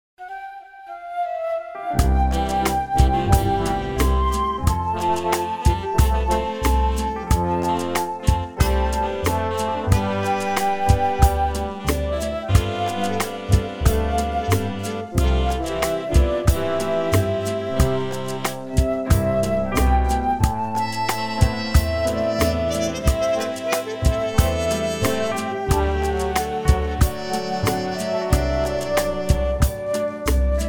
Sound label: cover flute melody reference